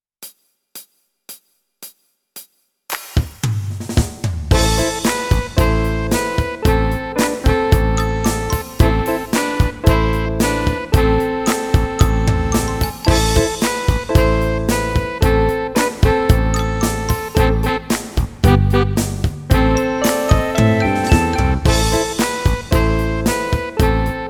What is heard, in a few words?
F sharp Jam Blues F